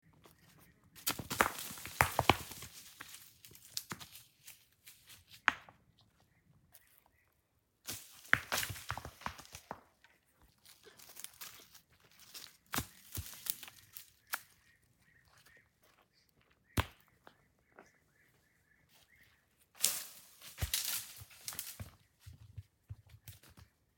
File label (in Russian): kamen brosili so sklona